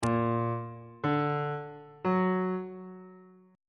b flat augmented triad chord on bass clef

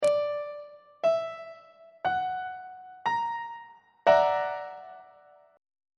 g flat augmented 7th 2nd inversion on treble clef

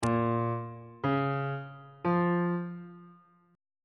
a sharp minor chord 1 is a sharp minor

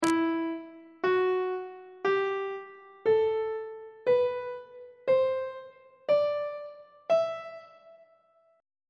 e minor scale note positions on treble clef

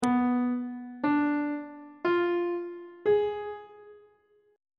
c flat diminished 7th chord intervals; on treble clef

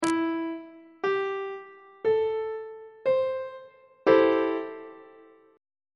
c major 6th 1st inversion on treble clef

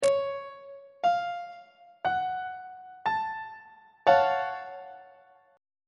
g flat minor major 7th 2nd inversion; on treble clef

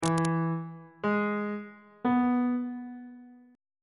d double sharp major triad chord intervals

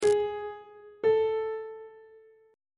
g sharp 1st interval pitches on treble clef

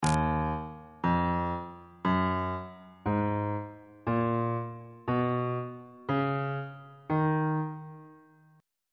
e flat aeolian mode on bass clef

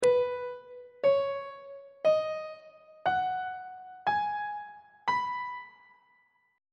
b major pentatonic scale on treble clef

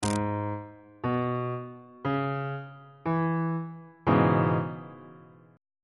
c sharp minor 7th 2nd inversion on bass clef

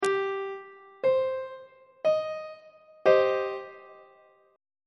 b sharp minor 2nd inversion on treble clef

